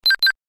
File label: Login confirm beep